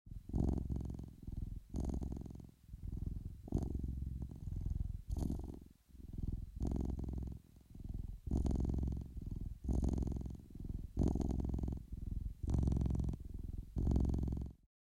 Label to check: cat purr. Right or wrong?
Right